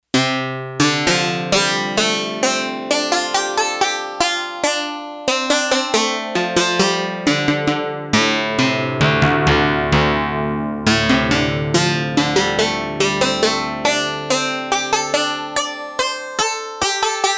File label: Shami, Yamaha TX802; Factory Patches; Voices